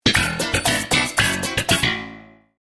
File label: Sitcom Bass Riff